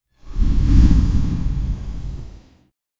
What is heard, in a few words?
a short modern pokmon sty, mythical